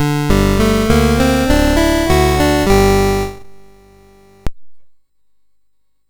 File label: Room Theme, MOS6581R2; Ultima III